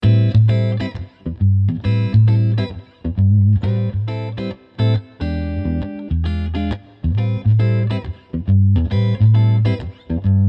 Blue Note Röhren Amp Clean Chords Groove Jazzy; König

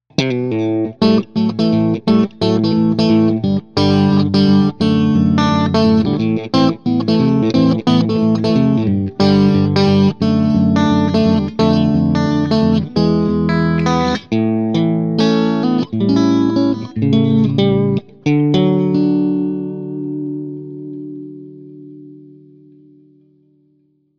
Clean Channel Grundsound Strat

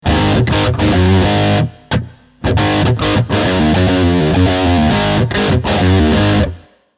Blue Note Röhren Amp OD LP Strong Chords; König

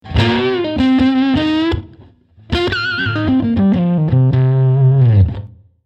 Blue Note Röhren Amp Les Paul Lead Dirty; König

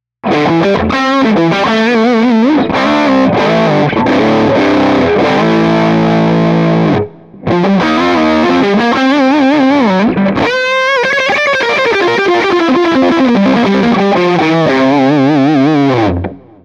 Channel 2 mehr ToneDrive und Mitten Les Paul

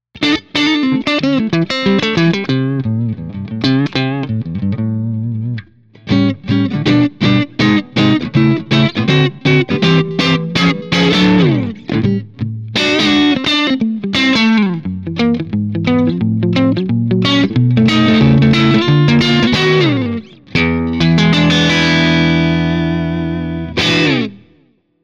Clean Channel Mehr Gain Strat

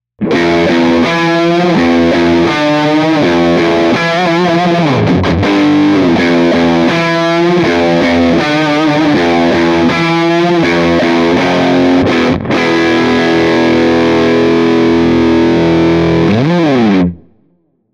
Channel 2 etwas weniger ToneDrive und Mitten Les Paul

